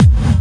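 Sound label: hard bass drums; Bazzdrumz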